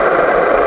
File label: wheels, skater; Tony Hawk's Project 8; XBOX